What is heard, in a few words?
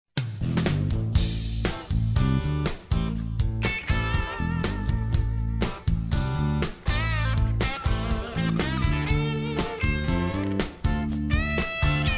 blues